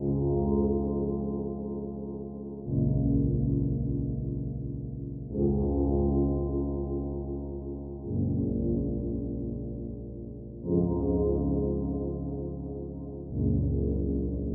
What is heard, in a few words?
Somber Ambience; I Wani Hug That Gator